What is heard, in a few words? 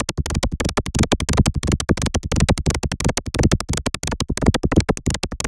glitch loop for artcore